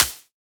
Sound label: Clap, Psytrance